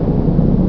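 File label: FAN HEAVY; Terra; TERACIDE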